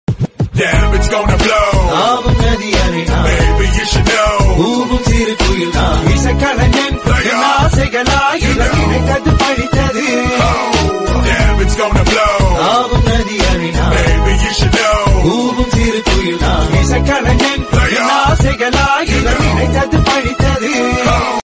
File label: dj remix song